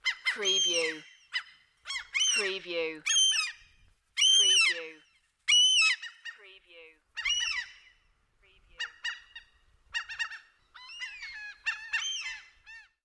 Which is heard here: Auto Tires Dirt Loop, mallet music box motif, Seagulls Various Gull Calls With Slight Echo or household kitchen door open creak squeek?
Seagulls Various Gull Calls With Slight Echo